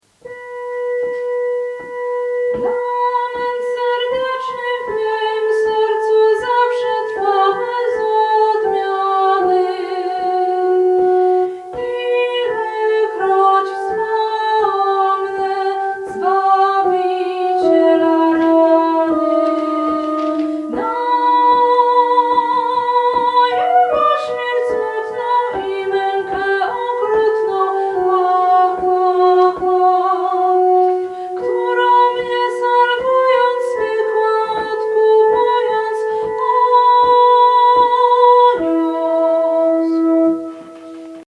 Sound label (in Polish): soprany